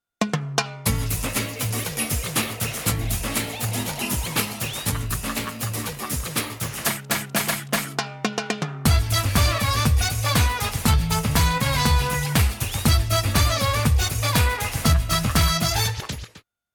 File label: drum fill